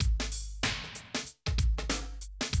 Classic DrumLoops

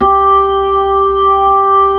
B-3, Brite, Keys of the 60s and 70s; Brite Xfade

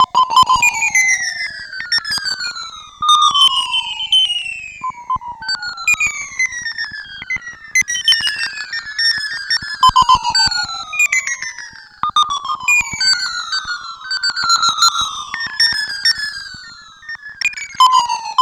Textural Soundscape, IDM

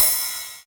909 ride; Goldbaby Tape808 & Tape909; The Tape 909; Cym

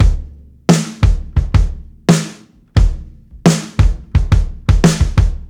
NO HH, breaks, drums; Basement drums vol 2

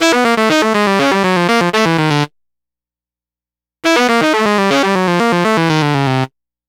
Sax Line; Dr. Fink's Funk Factory

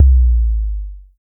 ANALOG K LG; Drums In Your FACE!

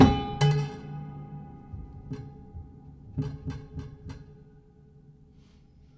Prepared Piano; Battery 3 Sample Kits

